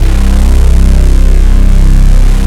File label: BRAS, Moog Synths; ORSON; E-MU Formula 4000 Series Vol. 3 – Analog Odyssey